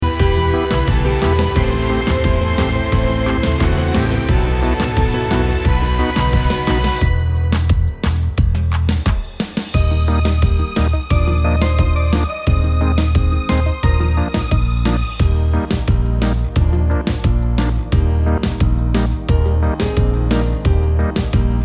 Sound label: kizomba